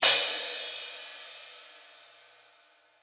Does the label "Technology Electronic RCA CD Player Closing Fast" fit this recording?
No